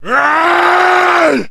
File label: man yell; Mount&Blade Warband